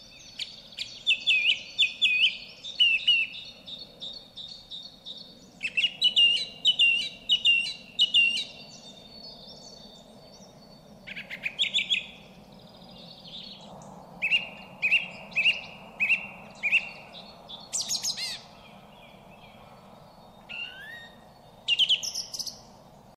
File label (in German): Singdrossel